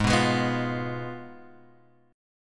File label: Ab Minor 6th, Guitar, Open G; down